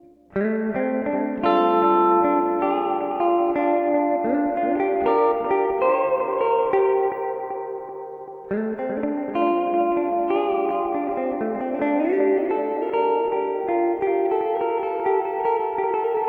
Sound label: Spring Reverb Analog Delay; Source Audio Collider